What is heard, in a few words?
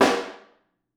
Room, Snare; Ableton Foundation FreePacks; Snare Designer